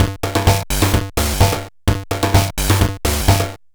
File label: Bent TR505 (128BPM), Circuit Bent